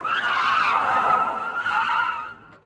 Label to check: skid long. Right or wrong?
Right